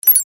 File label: click